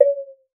WATER; Xsample VOL-9

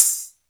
TAMBOURINE; Crypton - DrumBase5000; DANCE3+TB303

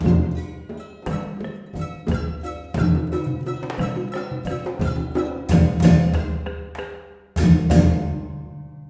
MALAY PHR L, Heart of Asia

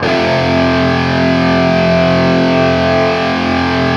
5TH F looped, Guitar; East West; Steve Stevens Guitar Samples Collection

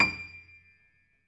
piano; East West; The Ultimate Piano Collection

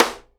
SIDE STICK, Live Snares

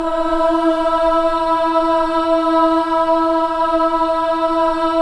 BOY BC AH, Boys Choir; Roland - LA Composer vol. 1

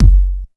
KICK deep, Techno Trance DRUMS; Techno Trance Essentials

